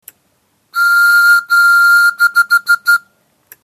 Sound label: straw hue